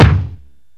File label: SDS7 BD; Hollywood Edge; The Big Bad Beatmachine